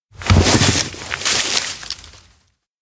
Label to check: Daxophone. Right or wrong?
Wrong